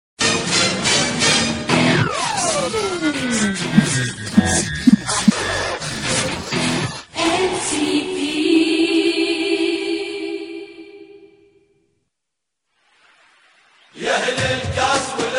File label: iraq song